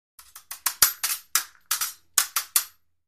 Fencing Quick Hits, Sword Hits, Swish, Sword Hits, Swish; Quick Sword Play